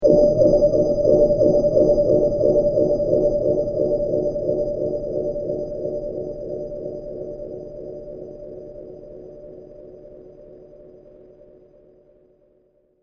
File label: Long Deep Reverberations, horror sound effect; Evil Sound Effects